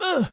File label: grunt; running back